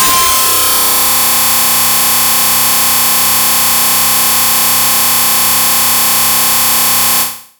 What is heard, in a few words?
Factory, FM; Polyend